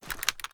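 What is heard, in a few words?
reload fast movement; reloads; gmod; iw8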